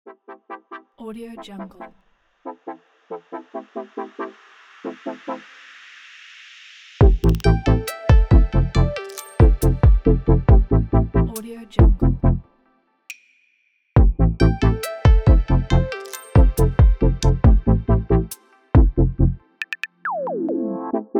abstract beat

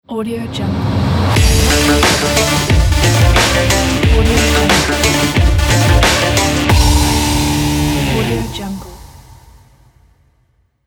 monster logo

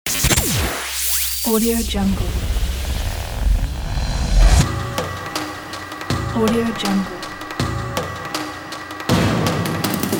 radio jingle ident

